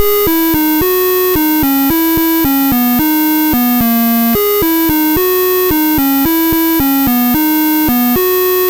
Apple II Synth, Chiptune

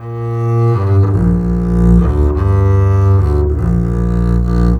Bass, Cinematic; Sonic Foundry (Sony Creative Software); Orchestral Series VOL-3 - Cinematic

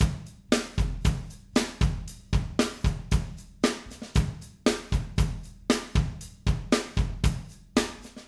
Acoustic Live Drum; 90 s Sample CDs; Platinum Audiolab

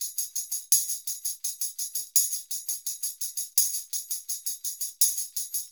PERCUSSION LOOPS; Sampleheads; NYC Percussionworks; ER LOOPS 84

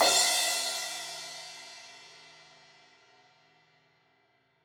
Crash 16in edge, Cymbals; Deeply Sampled Drums

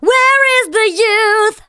VOCALS SUNG; Techno Trance Essentials

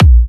A#, Kicks; markus hakala

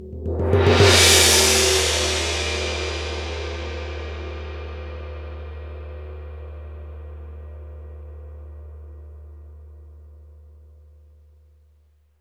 Gongs; CYM; SB Gongs

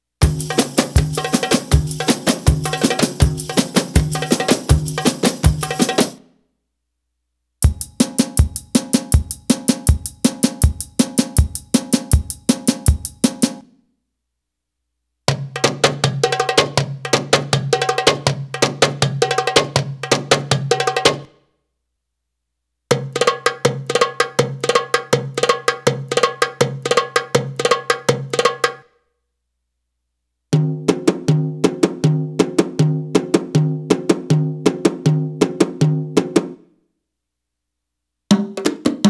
Arabic Rhythms; Hadeeth - Arabic Rhythms Sample CD

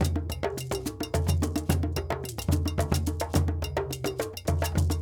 Djoun, World Percussion

